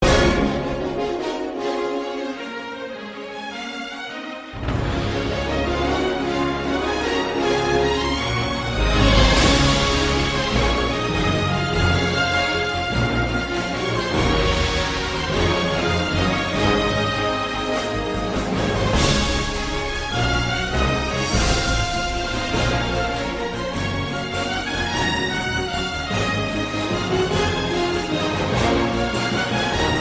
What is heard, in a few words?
tone ringtone; illumi nations